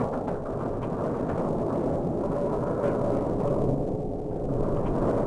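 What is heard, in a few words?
crate interior rattle loop; Star Wars Obi Wan; XBOX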